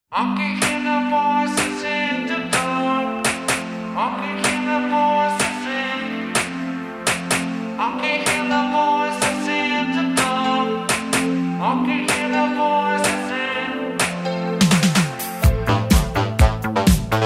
Instrumental

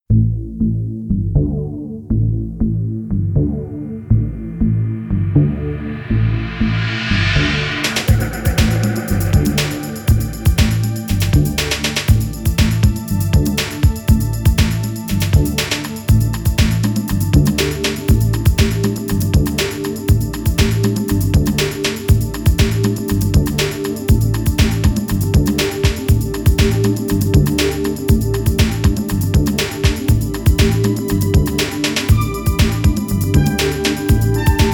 twinkle twinkle little star instrumental

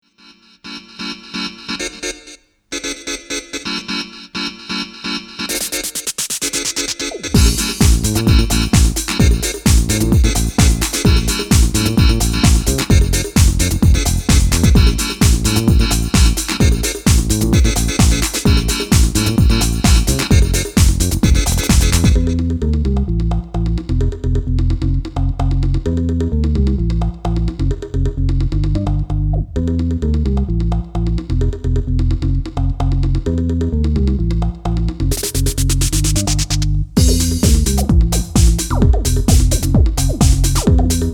Instrumental

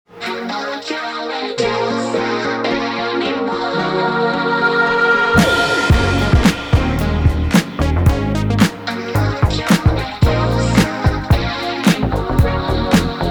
Milk Talk instrumental

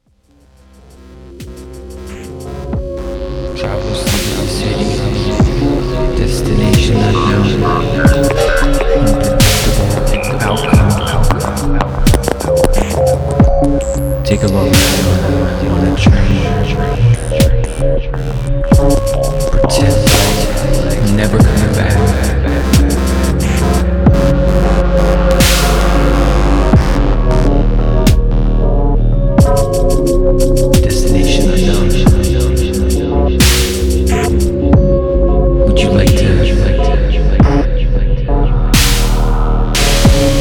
Synth Roller Remix

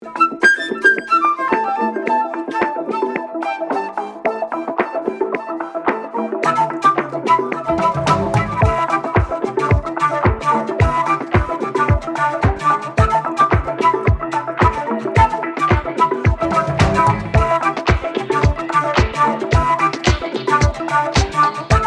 flute mix

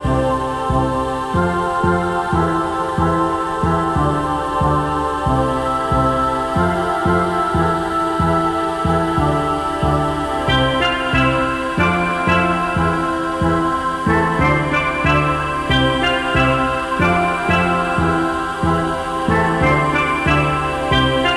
Positive Steel Drum

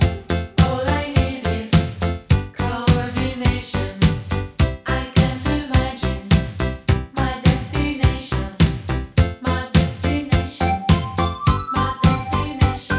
Saxophone Vocal

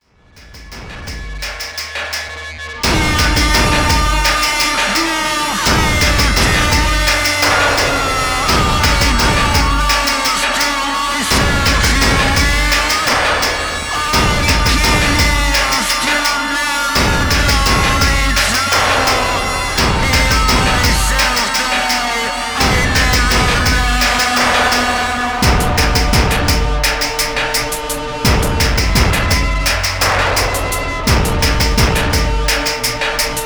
Techno

